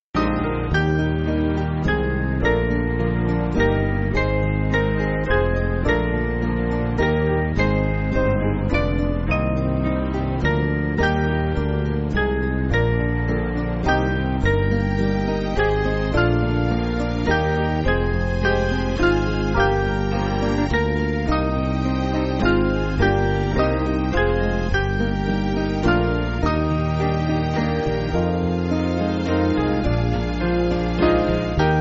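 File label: Band, Backing Files for Churches; Solemnis Haec Festivitas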